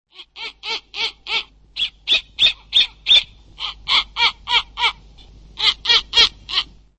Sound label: Bubo scandiacus; Chordata; LandBirds